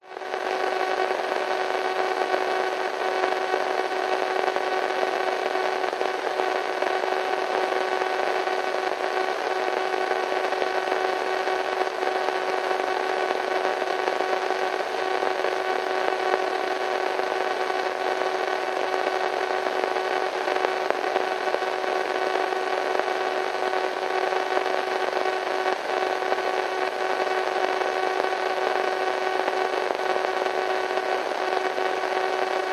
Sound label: technology radio static weak signal; zapsplat